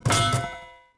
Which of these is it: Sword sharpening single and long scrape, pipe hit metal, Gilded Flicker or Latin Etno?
pipe hit metal